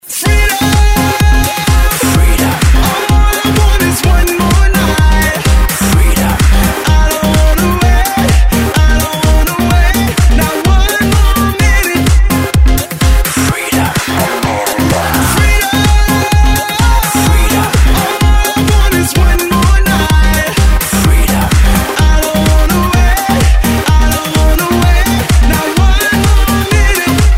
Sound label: organ remix